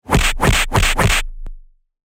Noise Punch; Doex Studio; 90s Anime SFX Pack

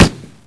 hmg, weapons; hl2

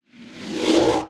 Whoosh Vacuum Movement Medium; L.A. Sounds; Transition Movement Pack 002

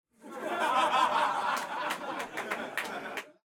WALLA - Crowd laugh and shout - Interior - Louder; Timothy McHugh; Crowd Laughter

